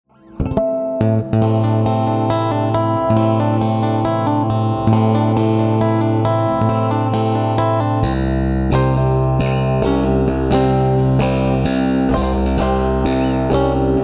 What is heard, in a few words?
Chimes